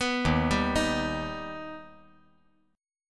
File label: Eb Augmented Eb; arpeggiated; JGuitar